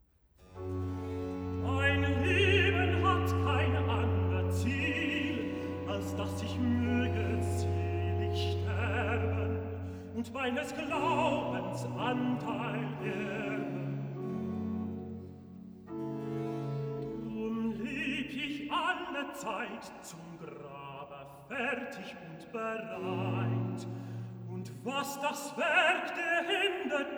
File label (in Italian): Cantata, Recitativo (Tenor); BWV 27 'Wer weiß, wie nahe mir mein Ende'; Bach; 16th Sun After Trinity